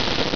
SLITHER

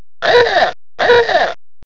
ahooga

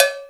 COWBEL; PERC; cubase

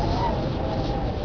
wind

